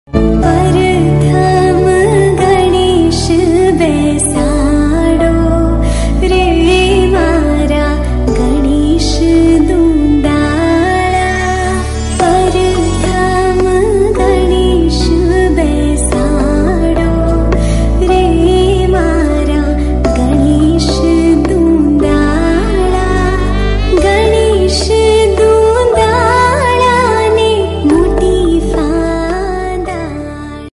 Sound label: song, gujarati